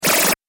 Game Alien Gun, Video Game Sound Effects

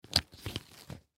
Camera Case, Camera Case Unbuckle; Cameras; Digital Olympus Camedia C720; Technology; Blastwave

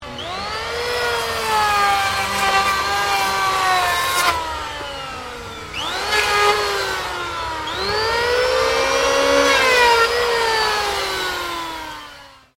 POWER TOOL ELECTRIC PLAIN WOOD; Various power tools

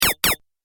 Double Laser Shot; Double Laser Shot 02; Laser Gun Sound Effects; Sci-Fi Laser Sound Effect; Andrew Fleming

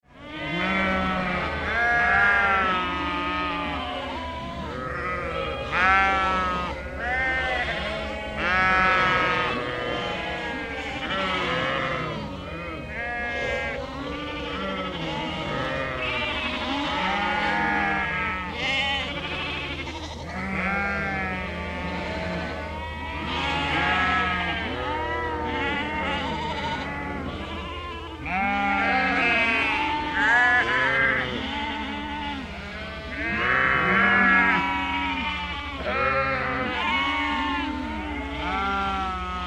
Sheep and Lambs Bleating, Close shot

